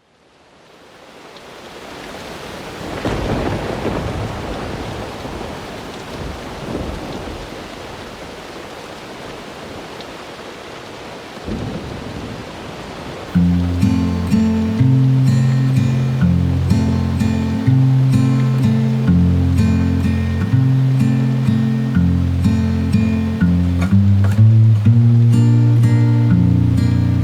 (Instrumental)

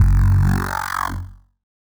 weird bass DRY, 113BPM

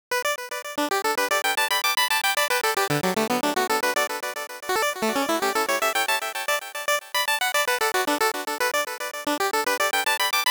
arps, 113BPM

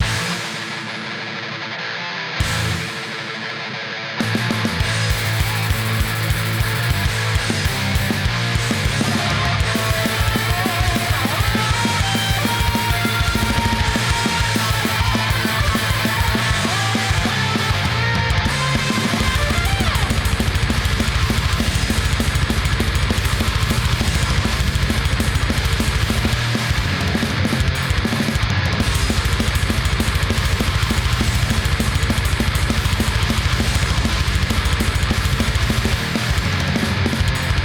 circle pit song metal

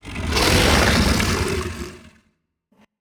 Monster; Zenless Zone Zero

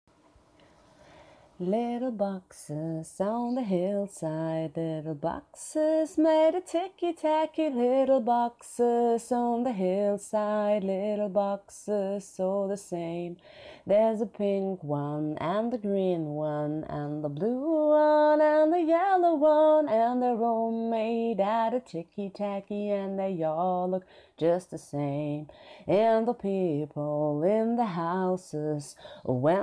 F Acapella Singing

